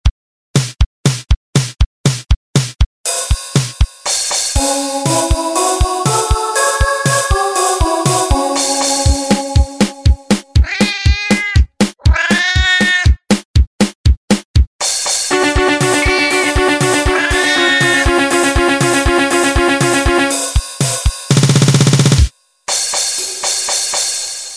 cat rock

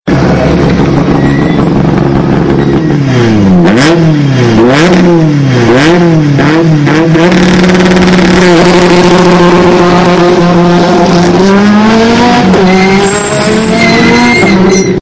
Rallycar Take Off; C2

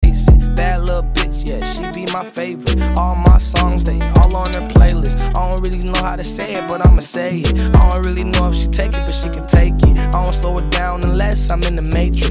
Baby call out

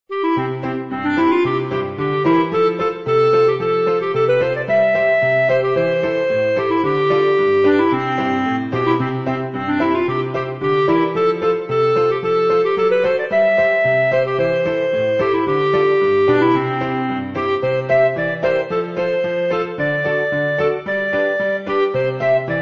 Dixie Tone

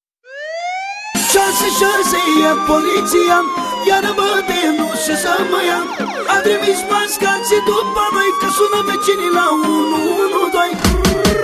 Police Soud Manele

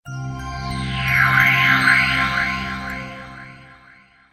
Startup Sms

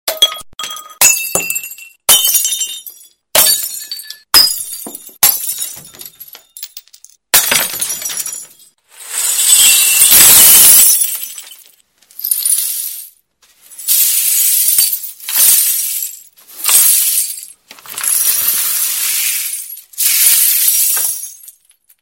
Glass Break

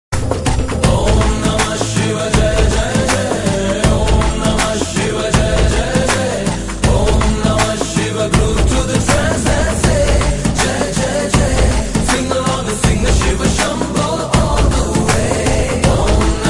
Bgm; Khaleja

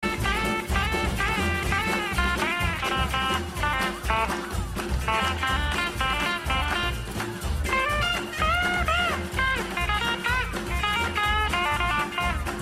ukelele solo; Stitch

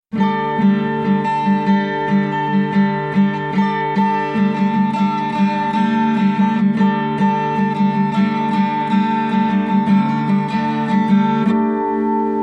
Acoustic Guitar

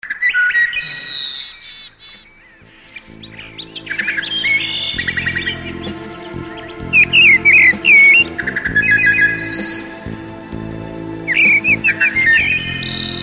Sound Of Nature

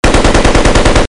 Machine Gun Sms

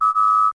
Computer; Voyager

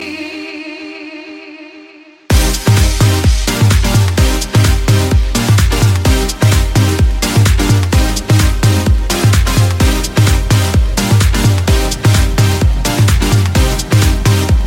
Nice Rythem

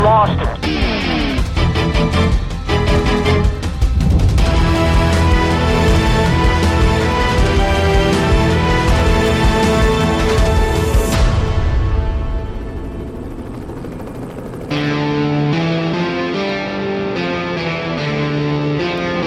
Instrumental; Dhoom 3

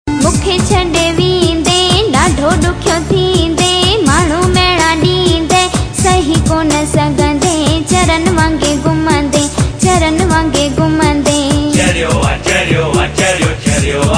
Sindhi Song

